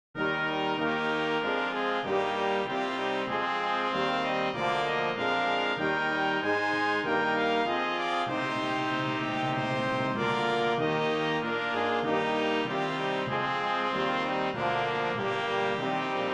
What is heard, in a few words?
Hymn Brass